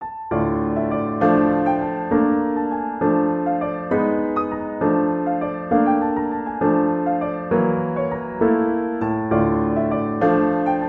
young wizard, piano

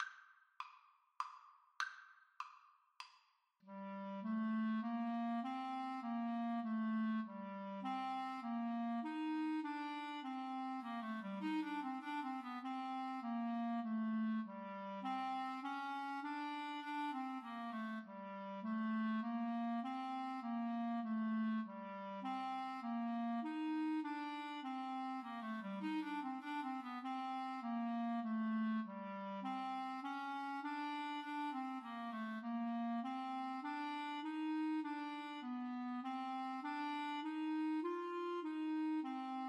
Minuet, Clarinet Duet

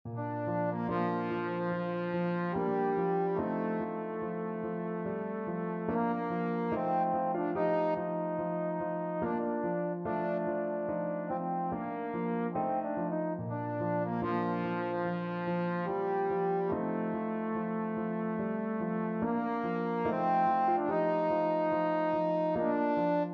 trombone

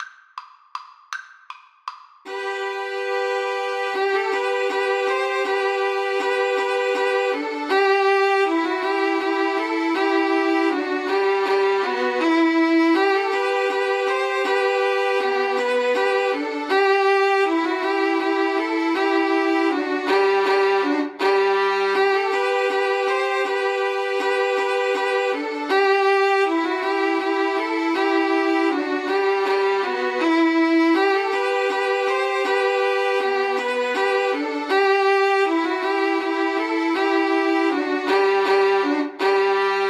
slavonic, Violin Trio

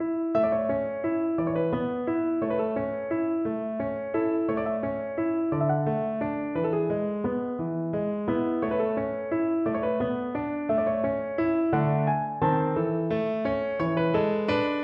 paisiello el cor piu violin

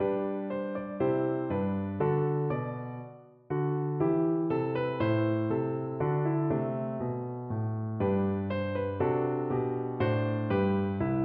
silent worship, viola

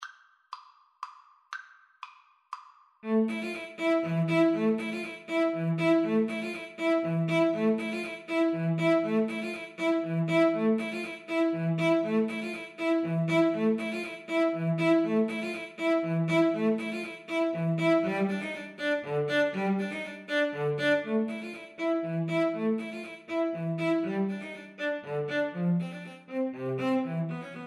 Bolero, Violin-Cello Duet

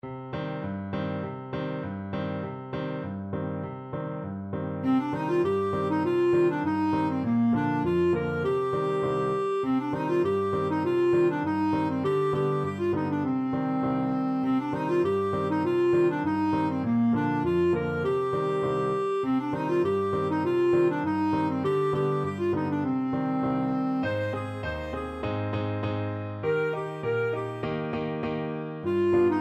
la mariposa, clarinet

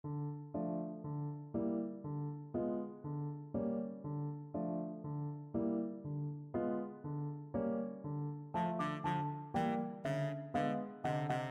baritone saxophone

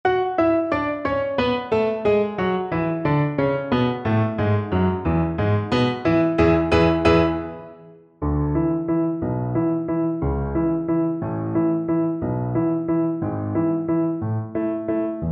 lake wal, Viola

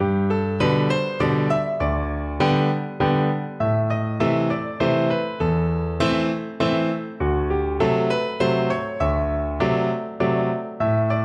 schubert waltz in a, piano

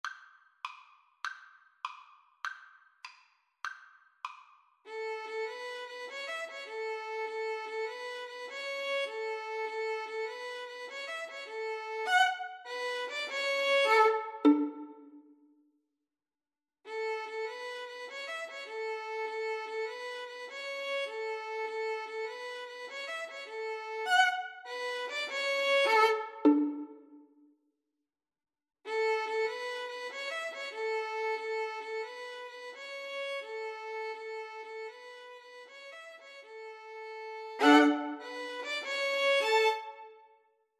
pop goes violin

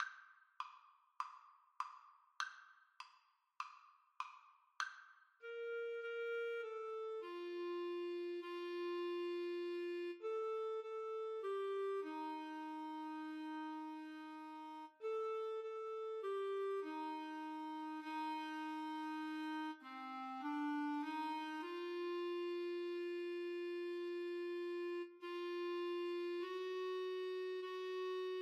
Clarinet Duet

